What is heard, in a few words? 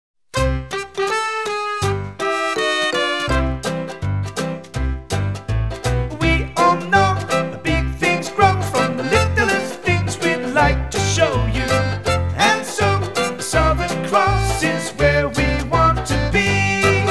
School Song with Words; Southern Cross